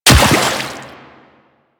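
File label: run on water, footsteps; Warframe; The New War; Kahl; Quests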